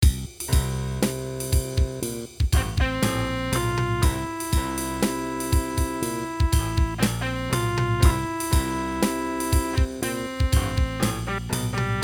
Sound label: MIDI blues